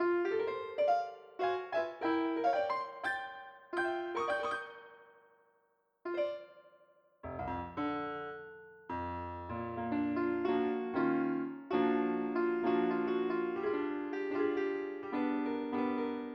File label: piano